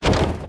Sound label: Collision